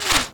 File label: bullet near miss; weapons fx; Counter Strike Model Pack; CS:GO